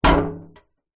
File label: impact metal large loose hit light